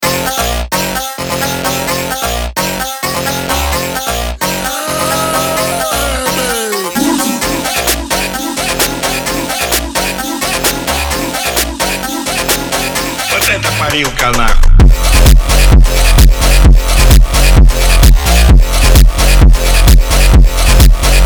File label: russian krushfunk